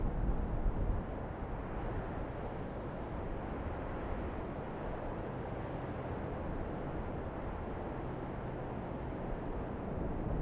wind, ambient; gesource

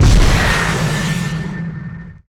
rpg fire, weapons

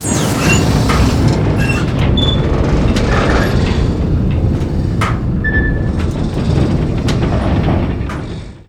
Large Blast Door MOVEMENT; gesource; doors

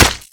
Hunting Knife Hit Limb; weapons

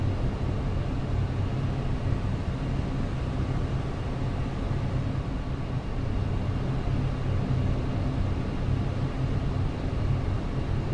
server room ambience; gesource

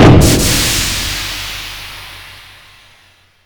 Large Blast Door SHUT; gesource

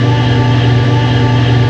door move; gesource; facility classic

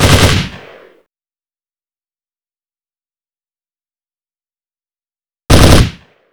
AR33 Burst Fire, weapons; gesource

